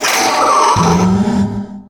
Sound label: hydra pain; hl2